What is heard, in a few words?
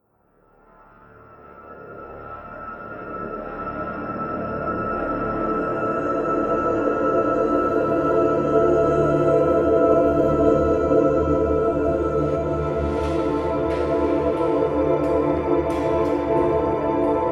Exploration Stinger